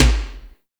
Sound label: GLOOM KICK; Drums In Your FACE!